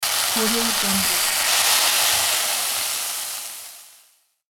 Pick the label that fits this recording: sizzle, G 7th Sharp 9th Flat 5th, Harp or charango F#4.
sizzle